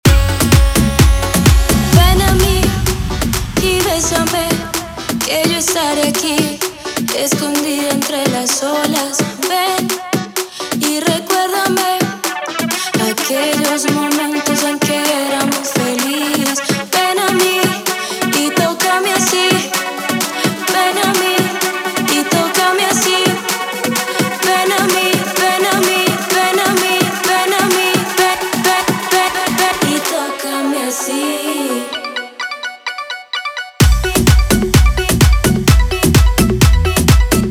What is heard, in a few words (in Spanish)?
aleteo 128bpm